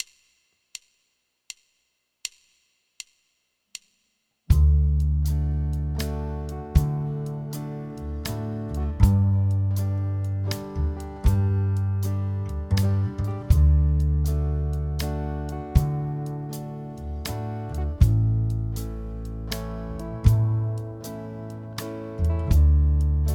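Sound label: Country Solo Backing Track; Coutry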